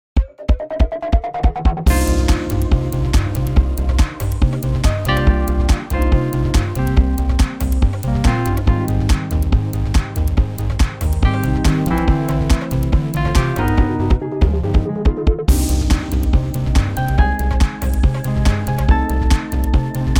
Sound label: Cm Smooth; key - Cm - vocal range - C to D